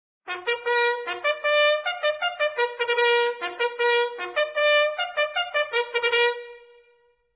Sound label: Fall in, bugle calls